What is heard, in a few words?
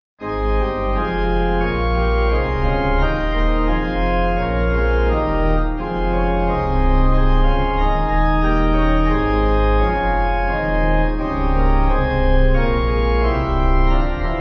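Pipe Organ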